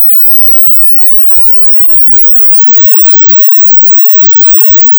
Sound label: high Sweep; Acoustics